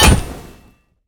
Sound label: basic impact; smite; thanatos